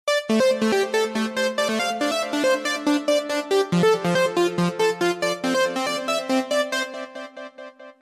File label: New Nokia